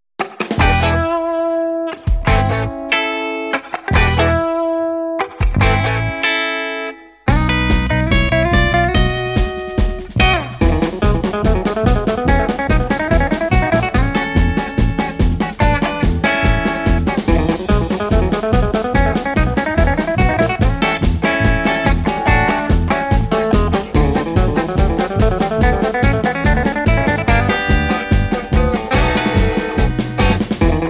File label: country bluegrass; cbstudioproductions; musique pub